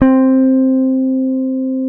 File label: P BASS SUSTN F C4; Sampleheads; Will Lee Bass Library